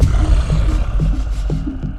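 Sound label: Music Soft Ground 120; from dust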